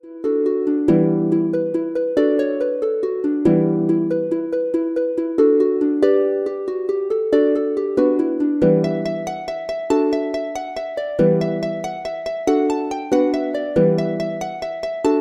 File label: Celtic Harp Jig; Chordophonet; Syntheway